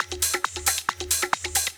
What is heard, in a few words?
Dance Grooves